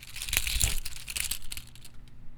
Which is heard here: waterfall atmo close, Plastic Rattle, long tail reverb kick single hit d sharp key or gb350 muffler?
Plastic Rattle